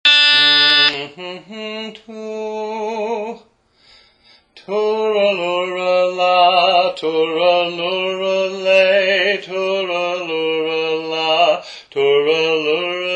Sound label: lead; Jug of Punch